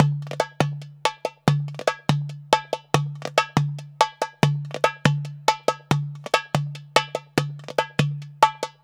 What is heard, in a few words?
DERBUKA, Balkanish Traditions; USB Soundscan vol.63; AKAI